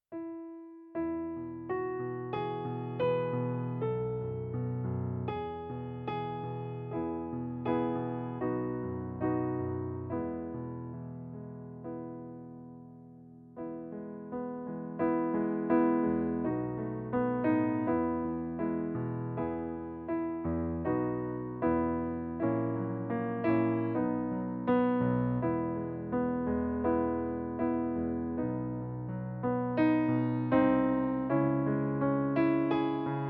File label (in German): Playback E-Dur